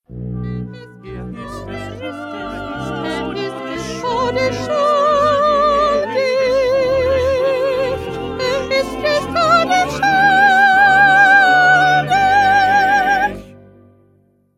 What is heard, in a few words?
2nd Soprano; Bach-St-Matthew-Passion-42